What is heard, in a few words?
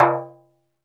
Dof Finger, Roland BK-5 Percussion